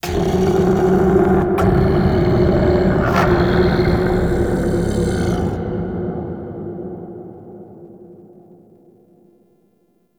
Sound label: Misc Shouts; Warframe; Man In The Wall; Entrati